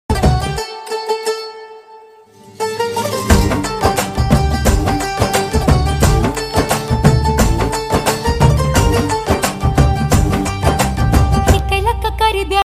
ringtone music

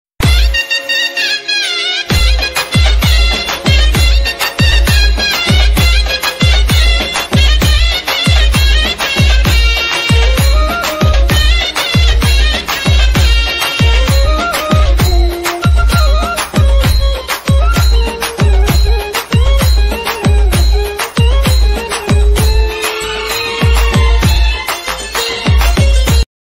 telugu bgm ringtone

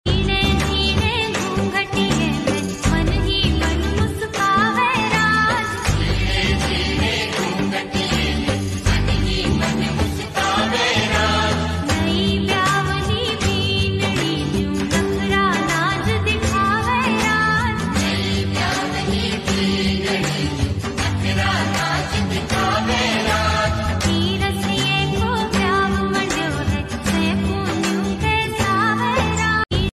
rajasthani song, marwadi song

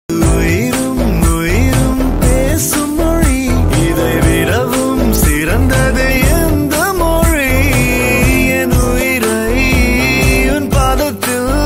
tamil

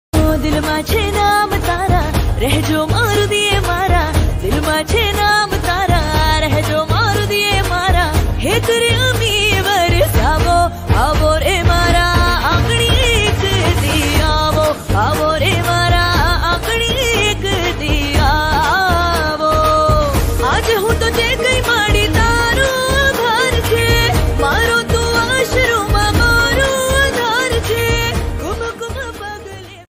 garba ringtone navratri special ringtone; darba ringtone

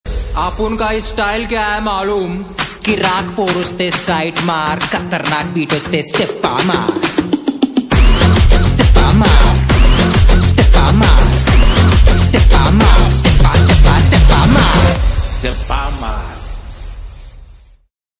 mass attitude ringtone; double ismart

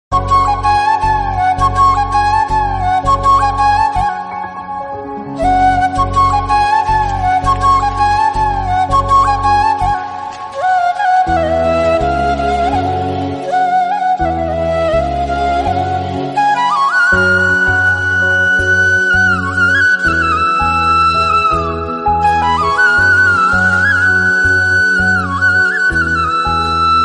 telugu ringtone south ringtones

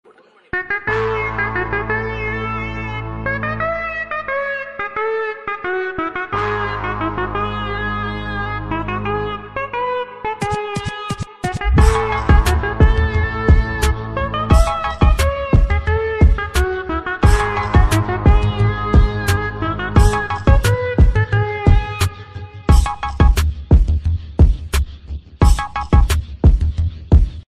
south love bgm ringtone; manjummel boys

